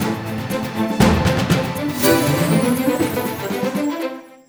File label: win; the knight the dragon